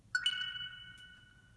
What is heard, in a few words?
Insta360 Power On